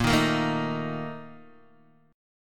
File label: Bb Major 7th Suspended 4th, Guitar, DADGAD